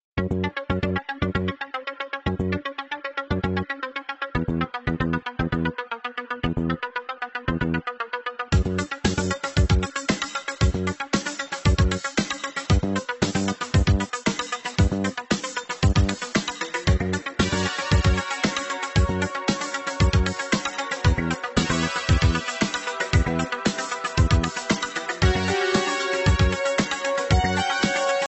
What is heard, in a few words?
English movie theme ringtone